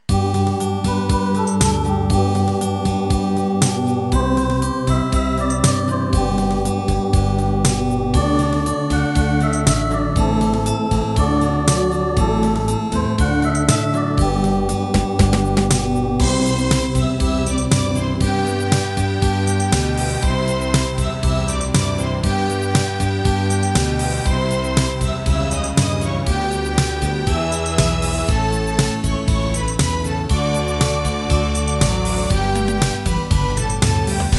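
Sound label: Schlager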